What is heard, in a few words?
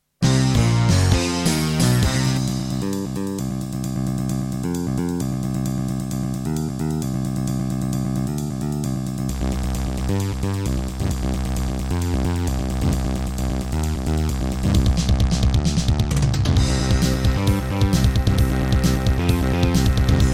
Miditemp DS-48 CleanWave32; sigil doom episode 5